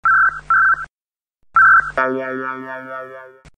Message Ringtone

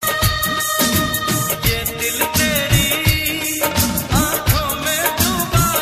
Old Song Ringtone

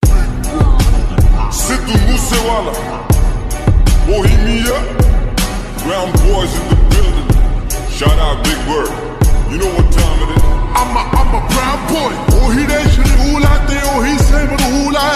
Attitude Song Ringtone

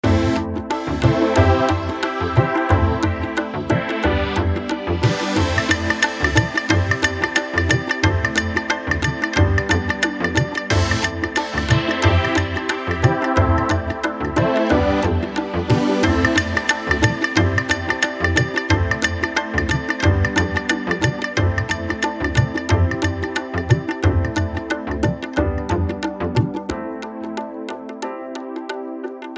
Punjabi Beat Ringtone; ringtones

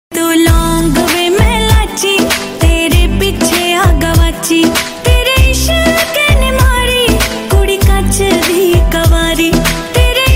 Ringtone Punjabi

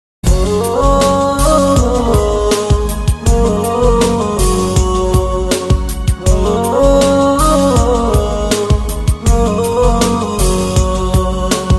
Good Morning Ringtone

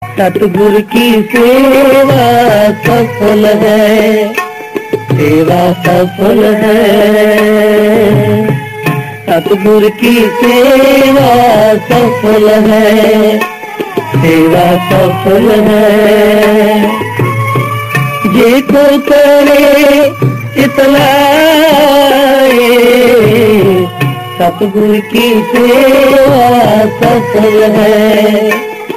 Gurbani Hindi Devotional

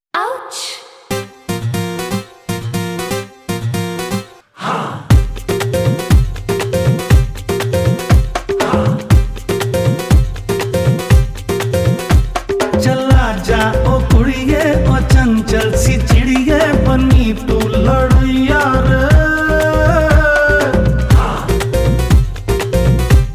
Bollywood Ringtone

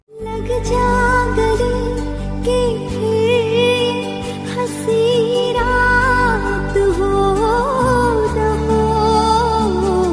Bollywood Ringtone

